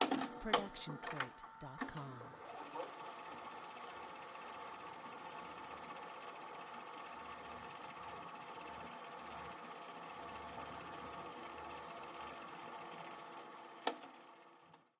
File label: VHS start play